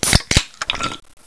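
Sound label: drinking, items; wartso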